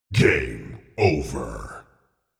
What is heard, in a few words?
game over